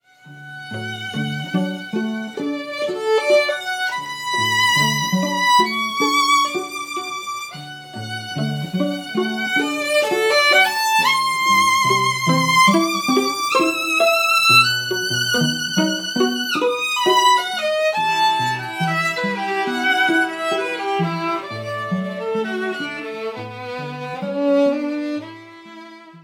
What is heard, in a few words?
meditation, quartet